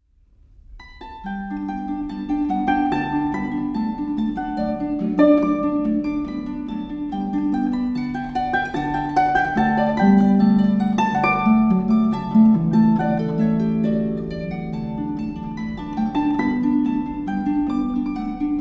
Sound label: Baby Opera, 胎教音乐; The Magic Flute; 莫扎特效应